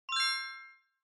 Bell Accent High Short

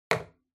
Spice Mill Put

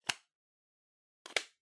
Spice Mill (Close)

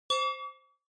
OS Sound Notification

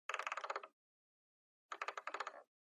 Portable Stereo Dial

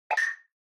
Percussive Accent Dry; 打楽器アクセント; ドライ

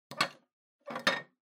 Public Phone Return Slot Empty

